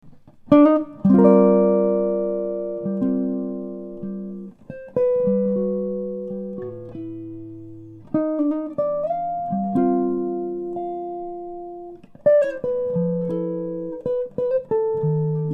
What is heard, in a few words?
16" Laminate Top & Back Archtop; Ken Rose Playing His 16" Laminate Top & Back Archtop